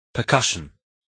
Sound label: percussion